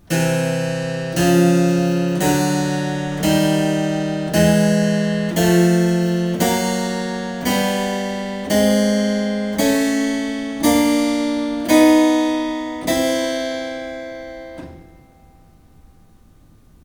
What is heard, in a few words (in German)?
Gleichstufig Terzen